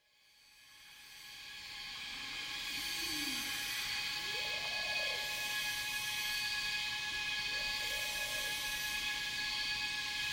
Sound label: cicadas and birds in rainforest